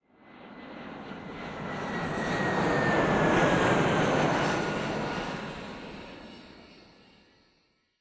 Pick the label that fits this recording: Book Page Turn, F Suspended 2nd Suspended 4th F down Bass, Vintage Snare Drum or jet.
jet